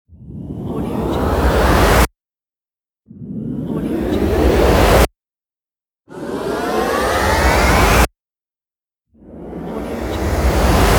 riser

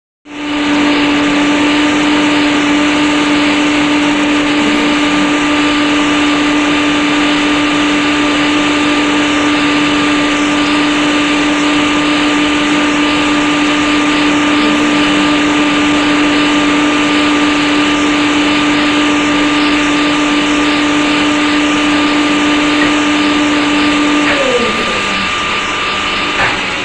generator large and constant from interior

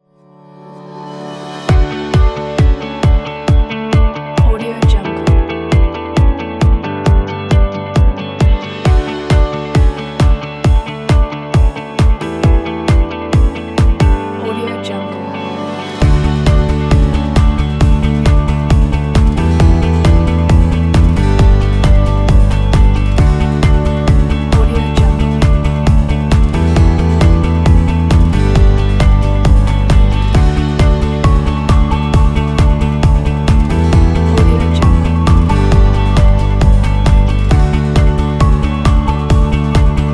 ambient corporate motivation background

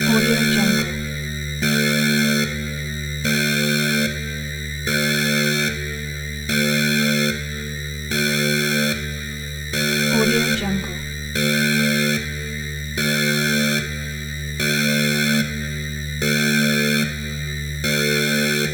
alarm

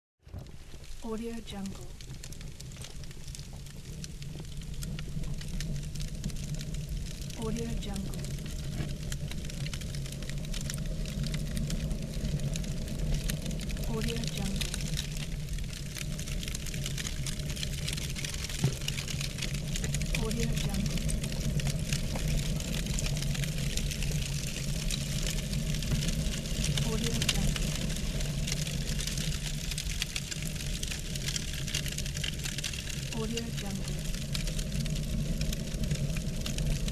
fireplace

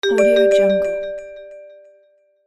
alert

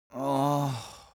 human vocal male sigh

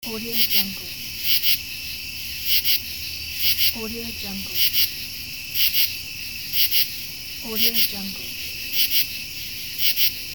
cicadas ambience

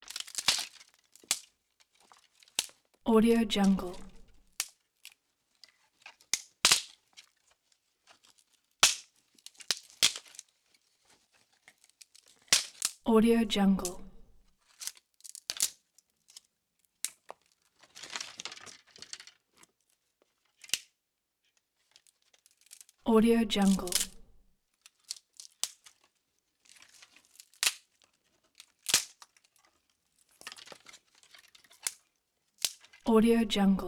tree branch brakes wood cracks and snaps

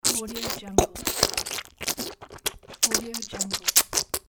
disgusting slime loop; ikosonik

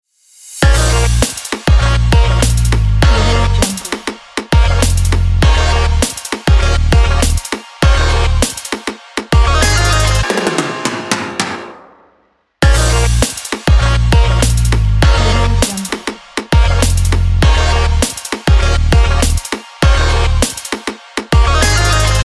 happy excited intro

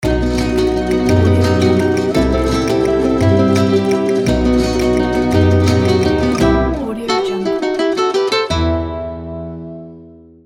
spanish logo

